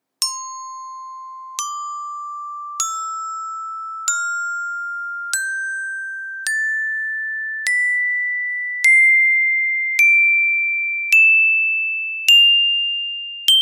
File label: Wooden Club